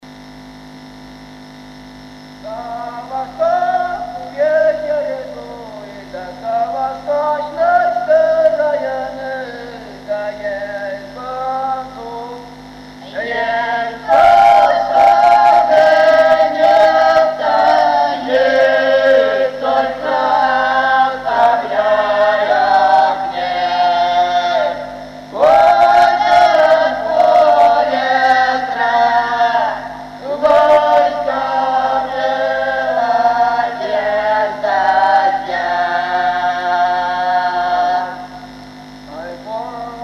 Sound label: duet